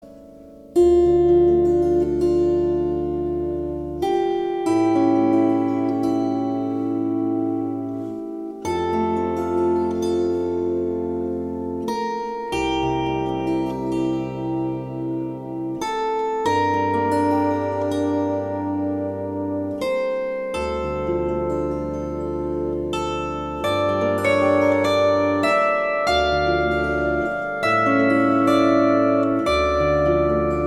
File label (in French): Sarabande; G.F. Haendel